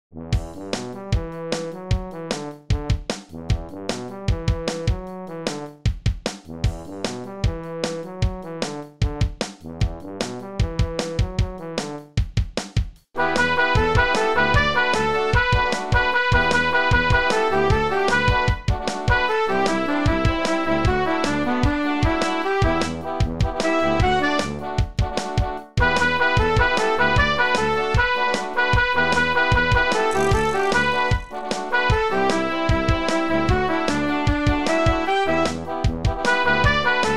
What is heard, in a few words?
dweilmuziek